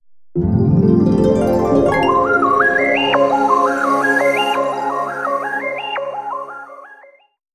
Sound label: jingle